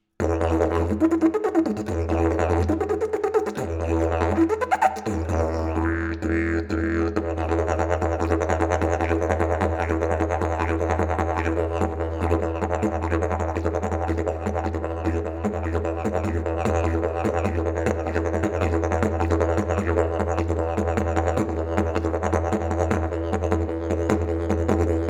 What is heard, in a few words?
didge